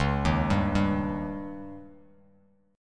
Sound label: Db Suspended 2nd Flat 5th, Bass Standard; arpeggiated; JGuitar